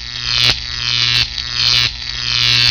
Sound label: PAIN